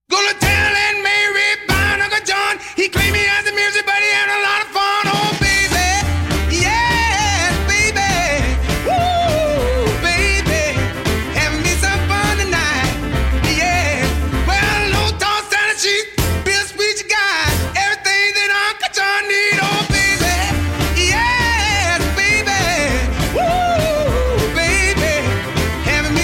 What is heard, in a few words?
Some fun, music